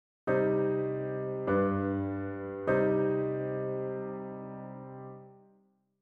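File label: C minor perfect authentic cadence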